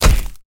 throw egg

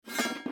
equip

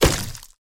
throw egg

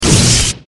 damage thunder

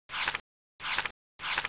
draw

